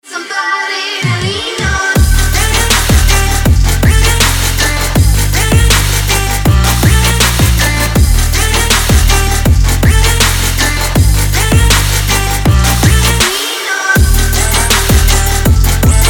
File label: trap remix